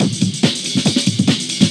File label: Breakbeat; Vengeance Samples; VEC2 Loops